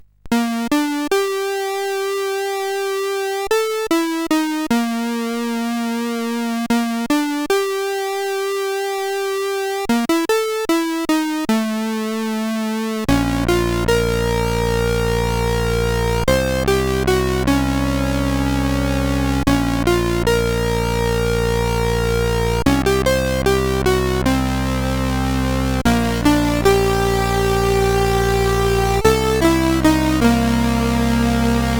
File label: 8-bit sid CSG8580R5; Civilization